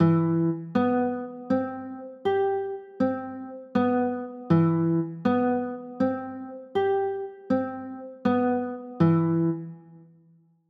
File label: c maj7